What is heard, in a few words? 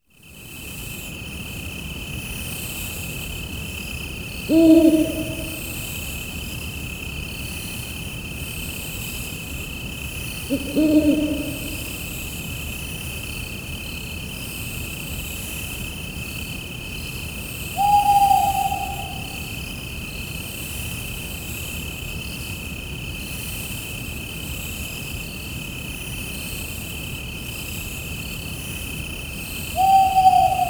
Cricket Ambience; onimusha warlords 2001; ps2